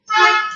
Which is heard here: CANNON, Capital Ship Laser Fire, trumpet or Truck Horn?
Truck Horn